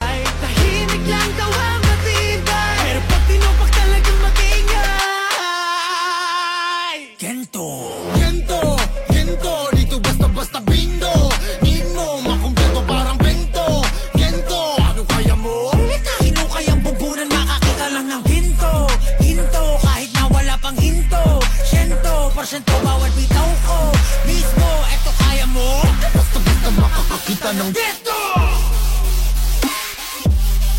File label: Vibes